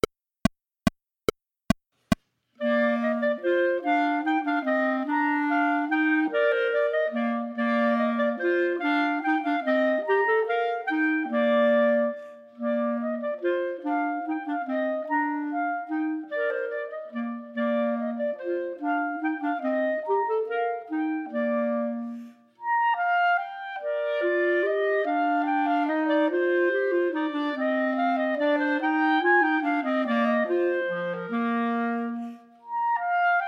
Minuet, Chamber Music for 3 Clarinets, minus 2nd part; Minuet II; Partita in F; Chamber Music for 3 Clarinets Vol I